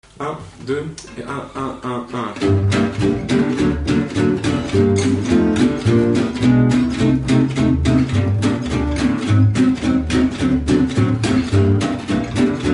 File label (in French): douce ambiance